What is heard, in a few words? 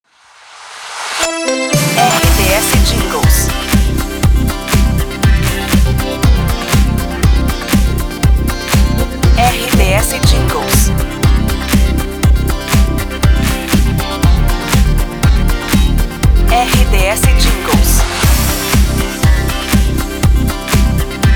promo; 012 News 2023; Pacote 012 News, de São José dos Campos/SP Estação com característica All News